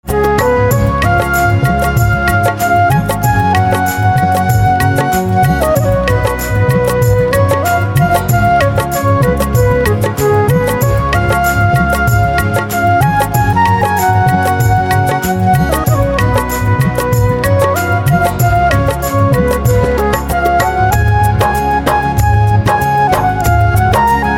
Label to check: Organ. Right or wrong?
Right